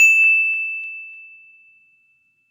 Latest iphone ding Ringtone

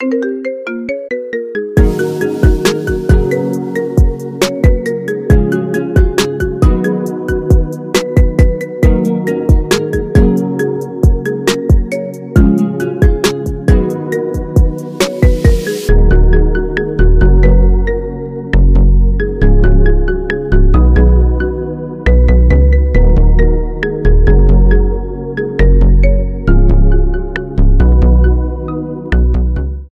New iPhone Romantic Ringtone

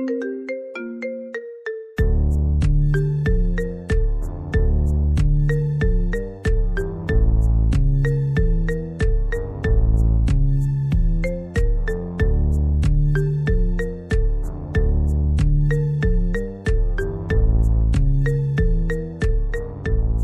Apple iPhone Remix

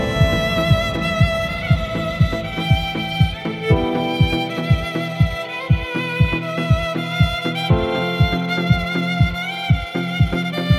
Apple Flute Ringtone

